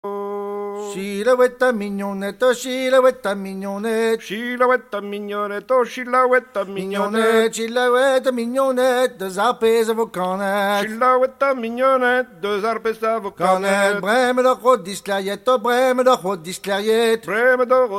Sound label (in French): plin